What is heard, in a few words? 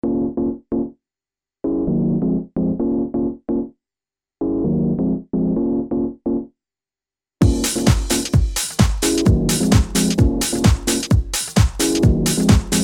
House, Waldorf Pulse; PULSation